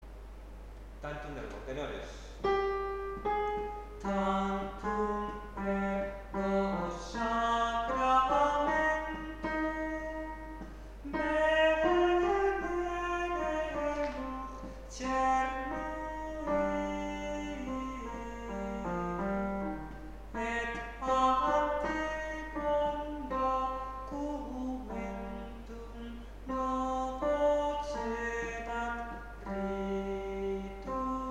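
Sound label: tantum ergo tenor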